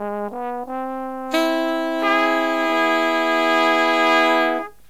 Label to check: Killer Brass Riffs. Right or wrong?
Right